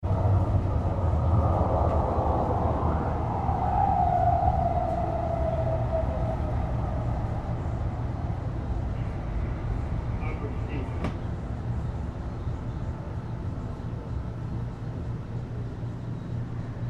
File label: Subway Ambience Electric Trains Arrive And Leave Doors Open And Close; LYN107 package of Urban Spaces